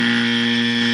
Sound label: buzzer error